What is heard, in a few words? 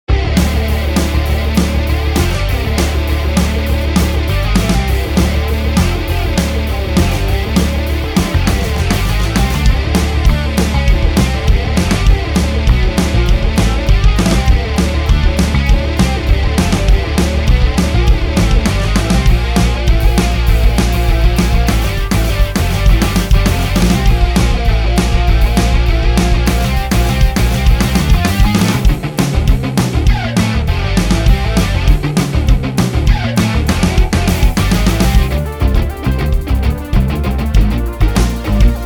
heavy fighting loop